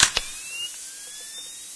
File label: nvg on; Source Engine; cstrike